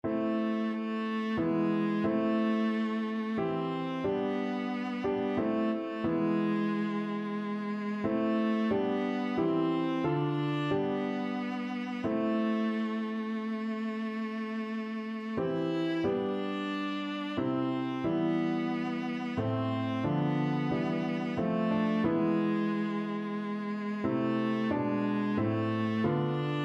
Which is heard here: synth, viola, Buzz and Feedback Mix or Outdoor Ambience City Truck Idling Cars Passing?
viola